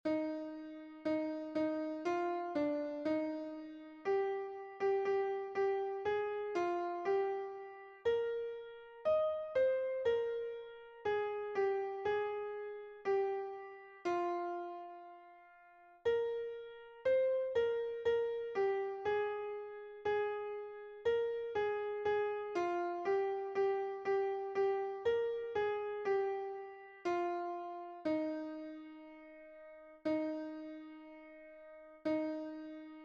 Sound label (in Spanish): soprano, himnos en piano; 64 GLORIOSO CRISTO; cantos del camino